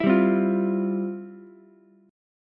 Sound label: F Major 7th Suspended 2nd, Ukulele; strummed; FM7sus2; 9,7,8,8; JGuitar